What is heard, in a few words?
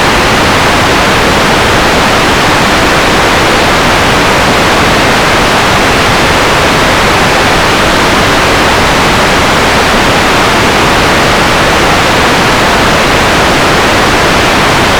SH101 White 100%; Denoising